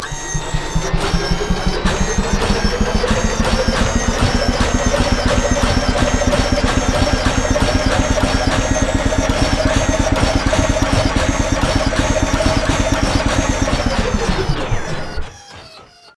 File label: mechanical Dolphin Stream